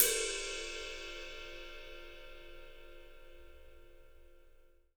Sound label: PW RIDE, RIDE CYMBAL, 3D Drums; 3D Sound